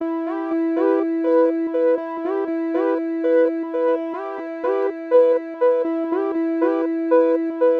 Portamento Synth Loop

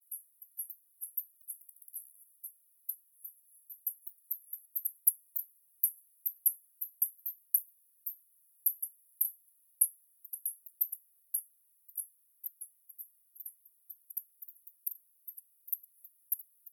Bats Hunting For Insects

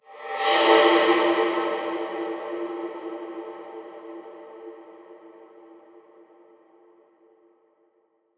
Small Swoosh

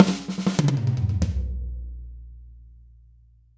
Drum Fill

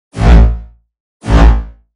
Low Robotic Sound

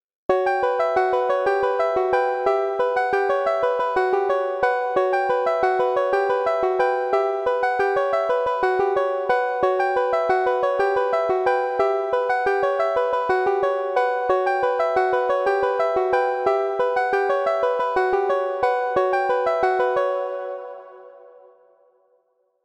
Oriental

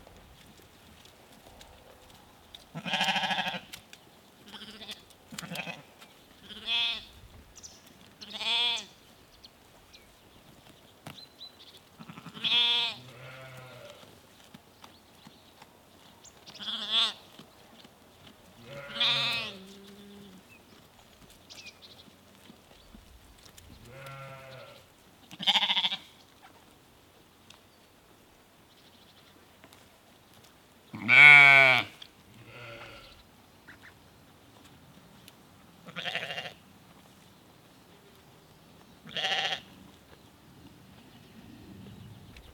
Sheep From Small Herd In Norway

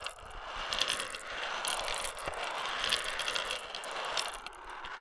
Glass Marbles Rolling On Wood

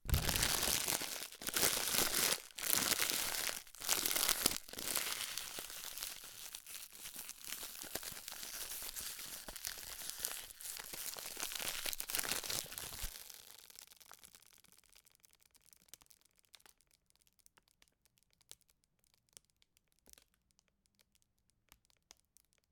Plastic

